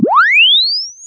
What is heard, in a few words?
Press Two Sides Chirp; Samsung 4 Recordings